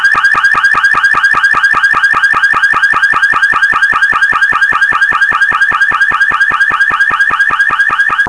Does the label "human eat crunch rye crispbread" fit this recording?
No